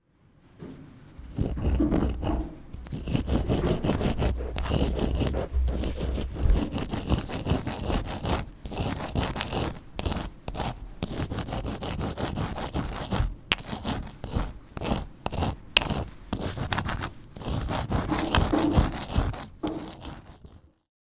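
ishi to ishi de goma nado wo suritsubusu oto, sound of grinding sesame between stones; 石と石でごまなどをすりつぶす音; （尖石縄文考古館）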